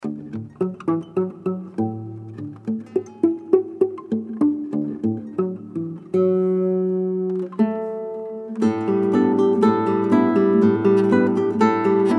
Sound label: Afro Cuban Lullaby